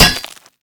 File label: hook land; garrysmod